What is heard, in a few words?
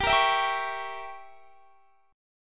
A sharp Diminished 7th, chord